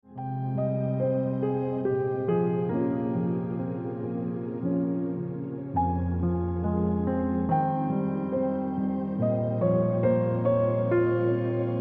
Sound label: Piano Music